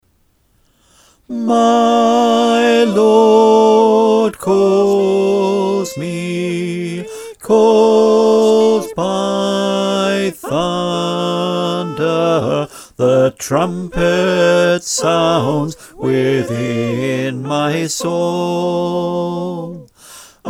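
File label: Bass, choir; Steal Away v1&2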